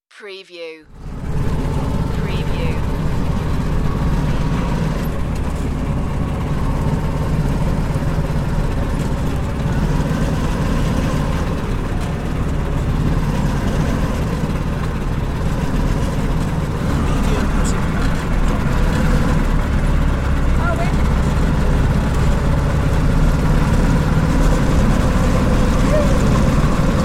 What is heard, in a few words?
Go Kart Idle